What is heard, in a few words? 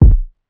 Jugg Kick; Vignette Kit